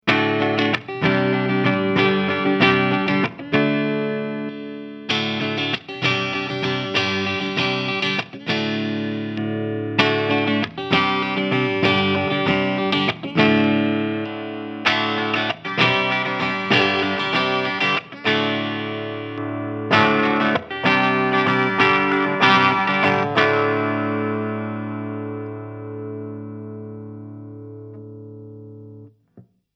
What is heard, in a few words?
Solar Guitars A1 7C Clean